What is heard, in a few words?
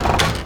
Window Shutter; The Princess and the Frog; wii windows; Hub Diner; Light Buford; Window Shutter Close